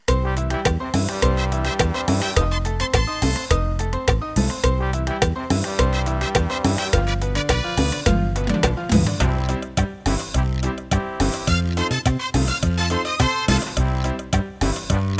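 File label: Cumbia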